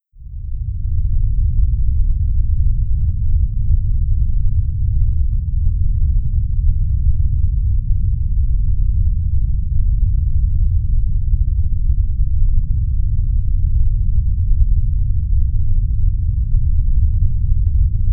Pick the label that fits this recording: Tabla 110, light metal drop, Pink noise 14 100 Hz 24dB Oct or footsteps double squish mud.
Pink noise 14 100 Hz 24dB Oct